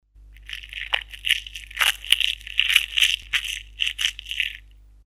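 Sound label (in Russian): звук шелеста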